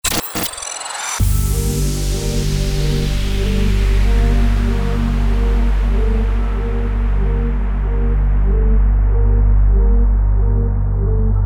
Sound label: DRONE 95BPM Gmin